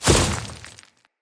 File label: Step Veg, Footsteps; Enemies; Grineer